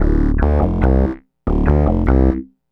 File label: SynthBass, Synth Bass Loops; Sonic Foundry (Sony Creative Software); Groove Elements